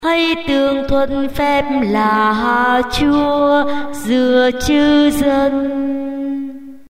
tenor basso

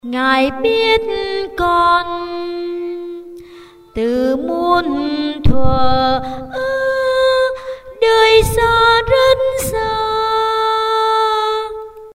tenor basso

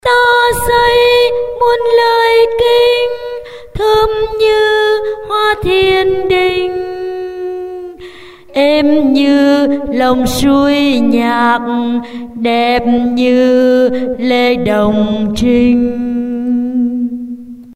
basso

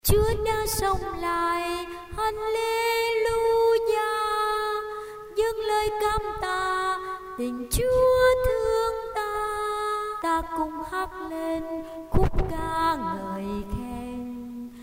alto basso

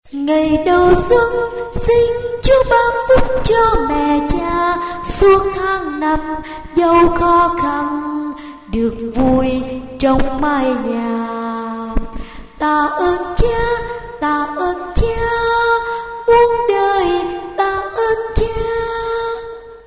sop alto

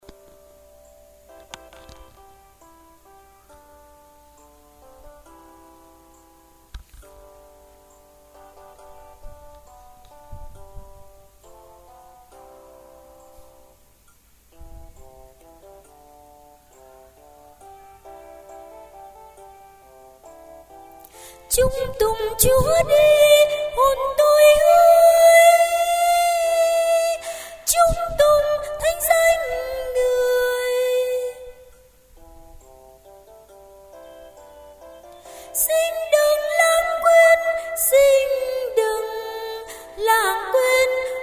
tenor

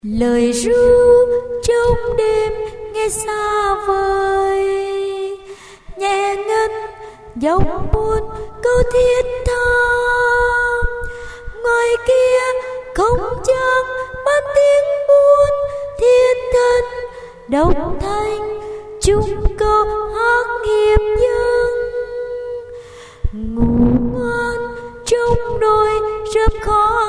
tenor basso

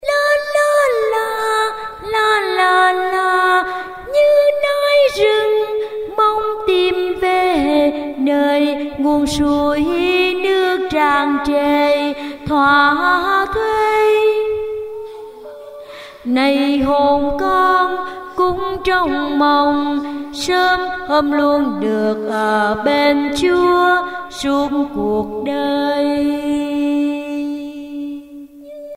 basso

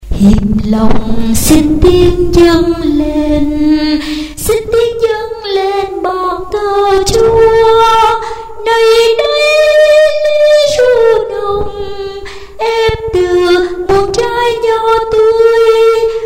sop alto

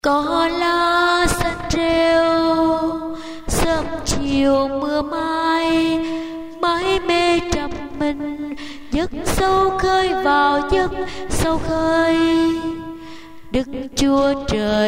alto

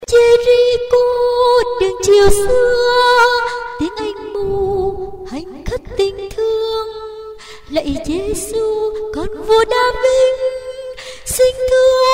alto

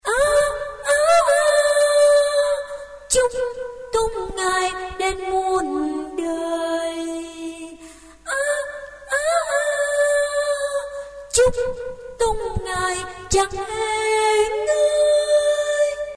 tenor

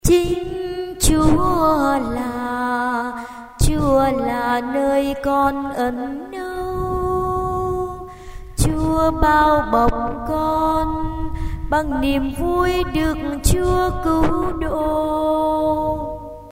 alto

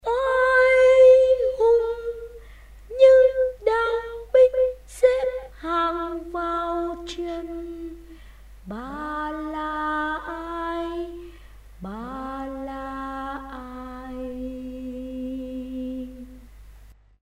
tenor

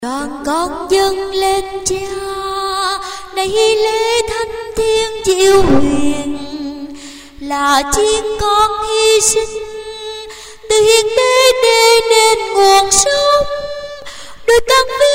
soprano

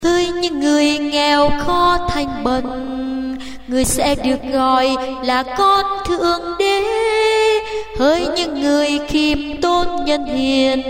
sop alto

